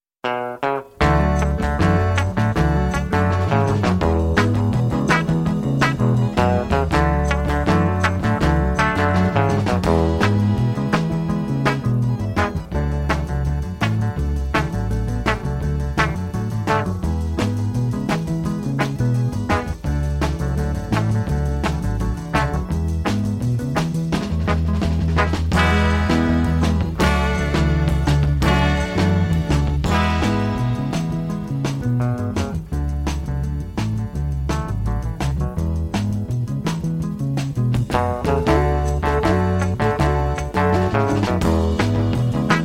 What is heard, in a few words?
Cold Rain And Snow (Instrumental)